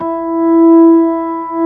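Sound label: ORG B3 E 4, organ; Best Service Gigapack 2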